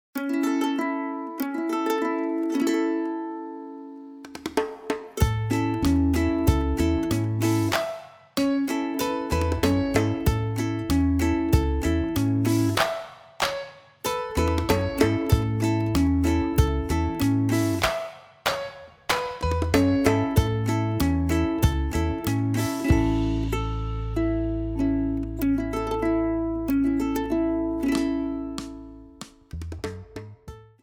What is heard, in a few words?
instrumental